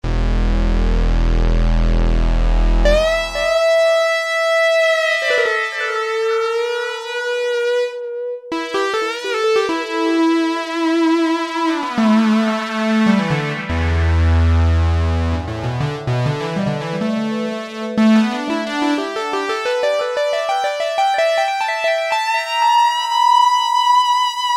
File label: Square Wave Polysynth; Greytsounds Philip Wolfe's Rock Keyboards